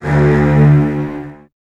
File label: D1, Virtuoso Strings, 16TH; ILIO; Kirk Hunter; CL 16TH